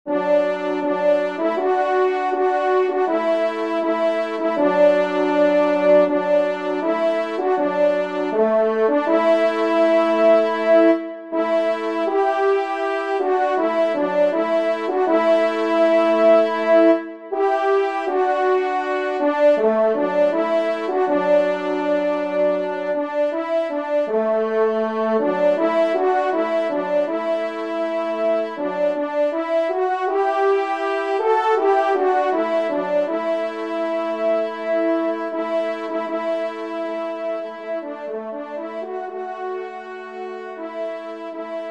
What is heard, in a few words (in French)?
Hymne a Saint Hubert